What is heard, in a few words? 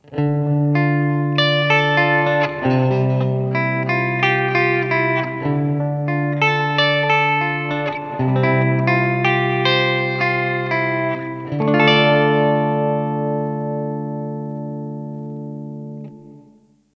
Boss RV-200 Hall